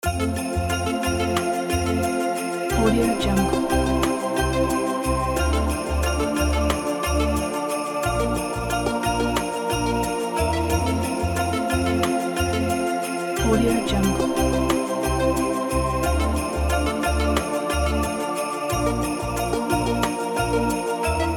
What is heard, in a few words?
the christmas loop